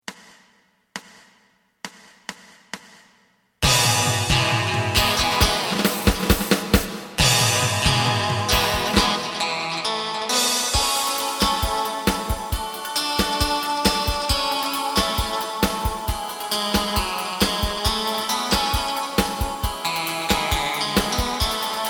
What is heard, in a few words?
bass